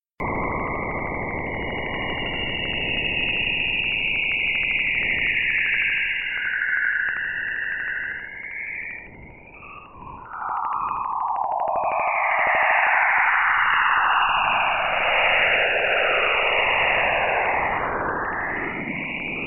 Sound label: Jupiter's Auroras; Juno Listens to Jupiter's Auroras